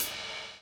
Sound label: DOWN, DOWN KIT 78